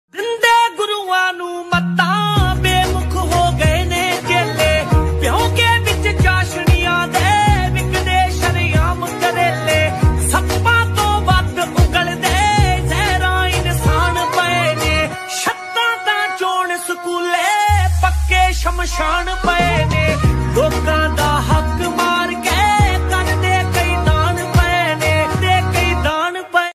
Android Phone Ringtone

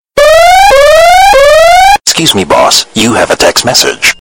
Sms Siren Ringtone

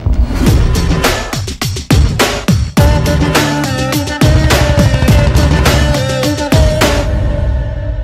Theme Telugu Ringtone; Janatha Garage

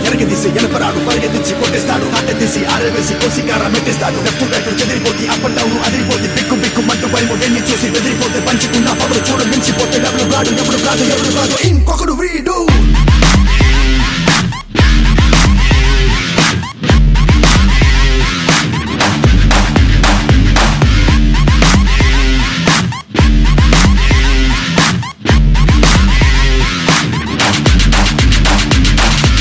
Theme Telugu Ringtone; Inkokkadu

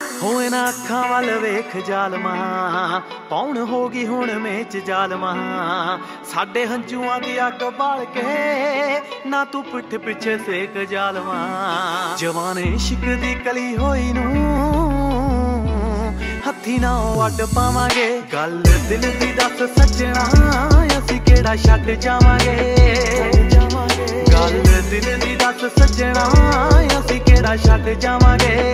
Dance Punjabi Ringtone

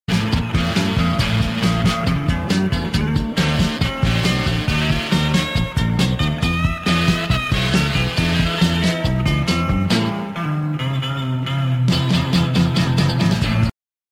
Dance Ringtone

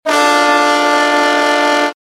Train Horn

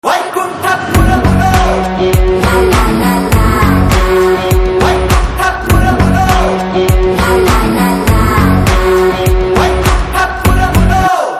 Title Promo Telugu Ringtone; Vaikunthapurramuloo

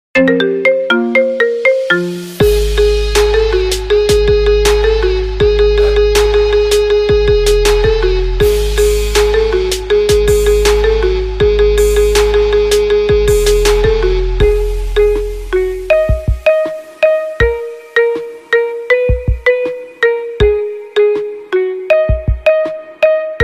Marimba Ringtone

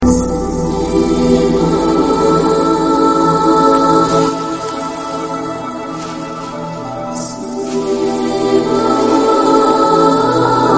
BGM Theme Telugu Ringtone; Parugu

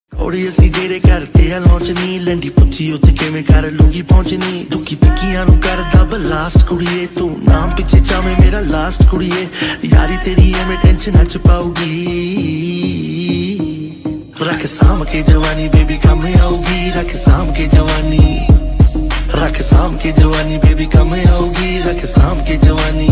DJ Punjabi Ringtone

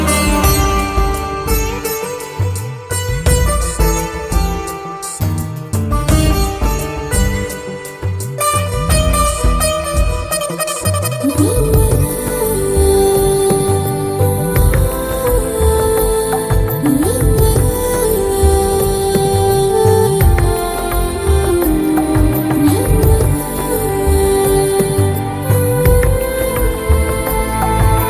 BG Humming Telugu Ringtone

